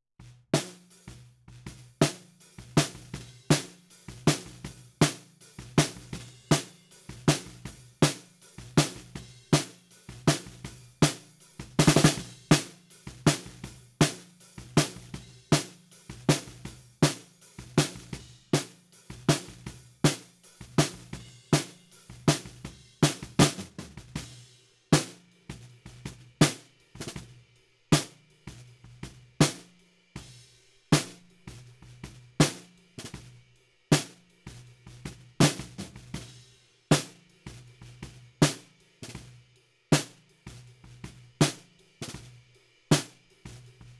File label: Snare Bottom, 160 BPM